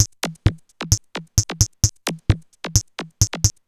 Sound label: Beat (130BPM), techno; techno drum fx